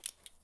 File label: stimulator drop; Escape from Tarkov